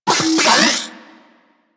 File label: Vocal Detonate; Warframe; Corpus; Mini Moa